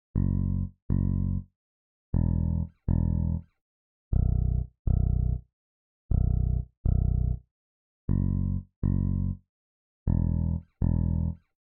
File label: Bass